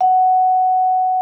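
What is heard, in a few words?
vibraphone F#4; Xsample VOL-4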